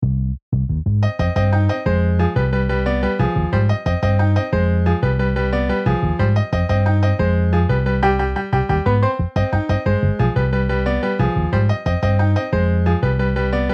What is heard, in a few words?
Piano Bass MIDI